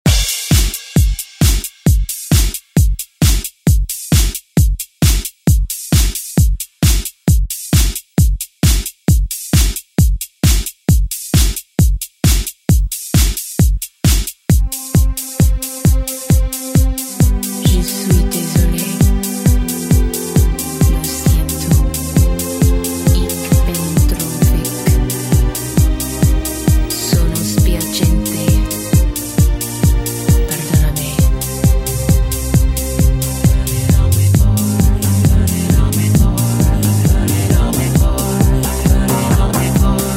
Re Drum

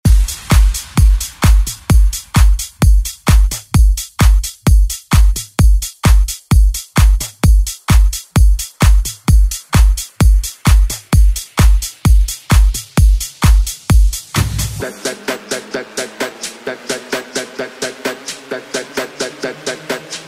Dance Redrum

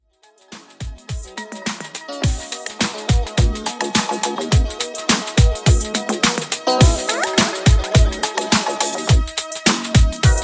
80s Redrum, 105 Bpm

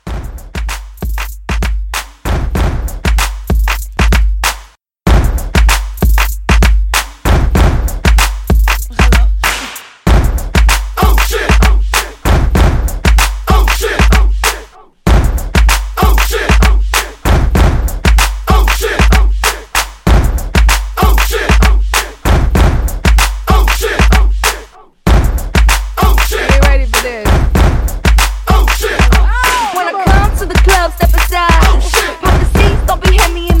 Mashup Re Drum 96

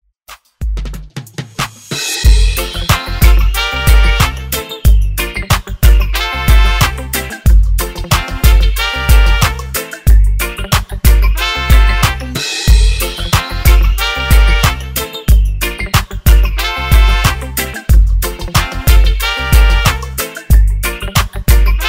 Reggae Redrum

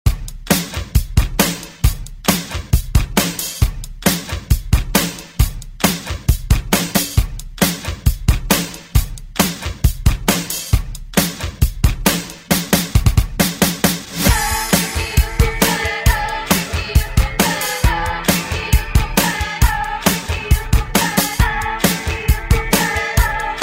Oldschool Redrum; Deejay Hit

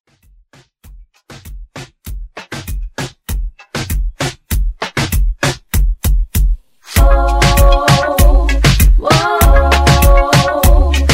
Reggaeton Hype

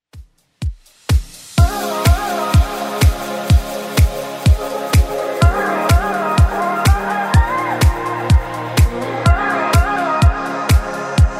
2ks Redrum 125bpm; remixplanet

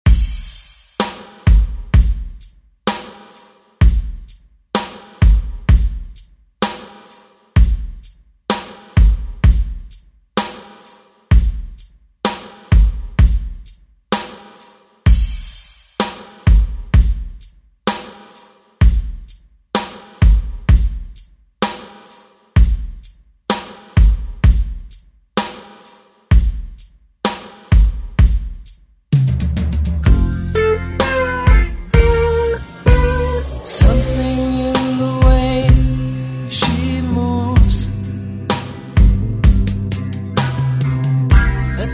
Rock Redrum; Deejay Hit

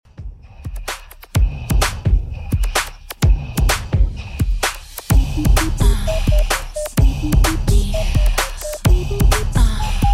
2ks Redrum 128bpm

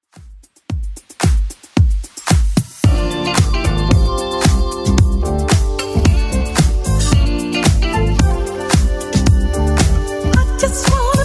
80s Club 112 bpm CLEAN

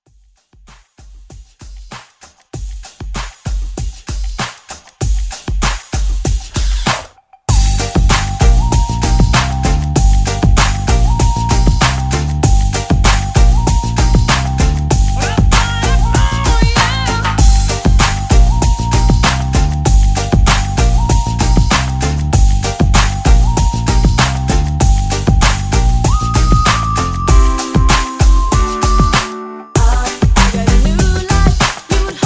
90s Pop Redrum

